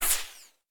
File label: bullet passby; War Thunder